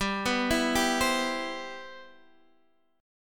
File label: C Major 7th, G, guitar chord